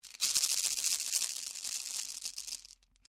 RATTLE GOURDE LONG, traditional pasific island gourde rattle; Rattle Sound Effects; Rattles (Sound Effects:Musical)